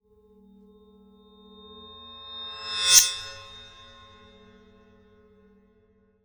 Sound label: Swell Metallic Ring; Impact; Bottle Rocket Fx; Impact Collection